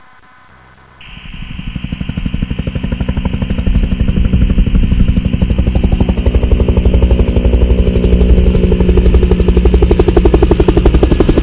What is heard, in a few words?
heli arrive